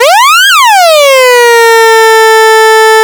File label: MEMORYMOOG